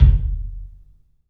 KIK, LIVE CLUB KK, Drums; 3D Sound

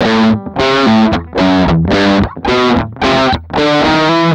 GUI; Best Service Gigapack 2

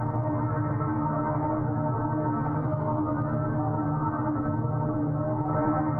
Tonal Re Draw, sparse soundscape

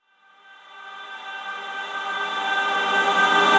Reverse Vocals G; Effects; Vengeance; Essential Clubsounds Vol.4

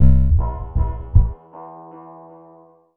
Metal Udu; Sonic Foundry (Sony Creative Software); Ma Ja Le Ethereal Textures

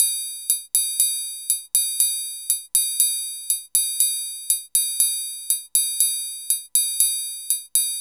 TRIANGLE; Sonic Foundry (Sony Creative Software); Joe Vitale Latin Percussion

